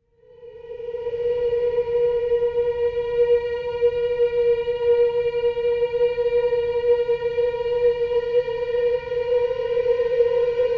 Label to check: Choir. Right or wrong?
Right